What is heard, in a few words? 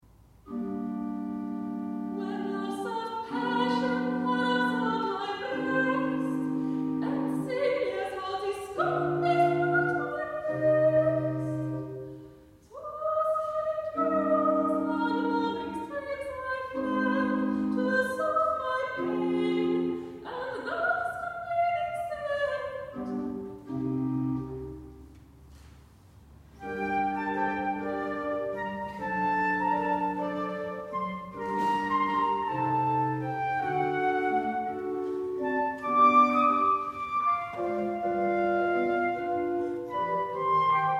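passion, organ; Chaam; J.Chr. Pepusch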